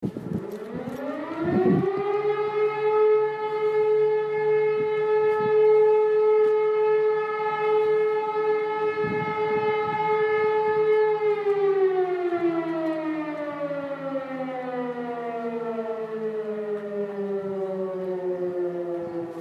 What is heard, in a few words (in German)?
Sirene, feuerwehr